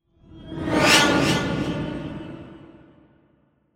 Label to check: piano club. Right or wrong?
Wrong